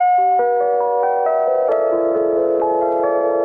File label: tinkly bits, loops electronic